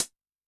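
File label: Tek Close, HiHat; Yamaha DTXpress-II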